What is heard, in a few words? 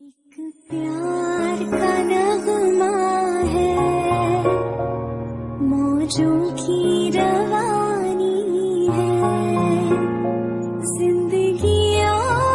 Female Song Ringtone; ringtones